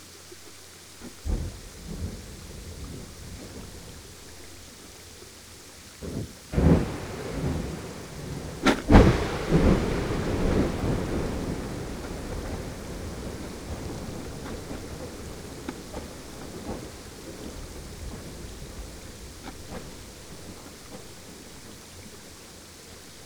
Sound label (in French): Hydrophone Triple crash; iceline